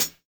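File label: lofi hi hat; Drums In Your FACE!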